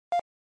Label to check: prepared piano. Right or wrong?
Wrong